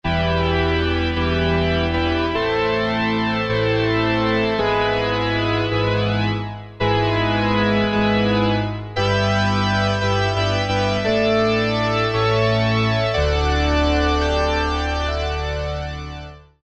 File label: Pipe Organ, Roland Alpha Juno-2; Factory B